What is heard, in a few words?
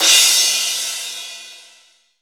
Drum Dry; East Collexion; Drum 1